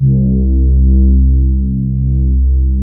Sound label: ARP, Analog Fantasies; USB Soundscan vol.49; AKAI